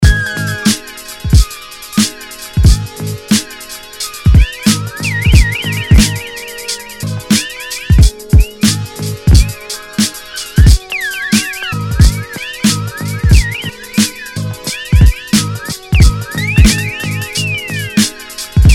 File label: Beat